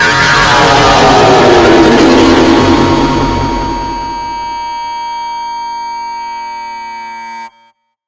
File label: die